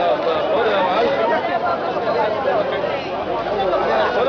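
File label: ambience crowd bazaar loop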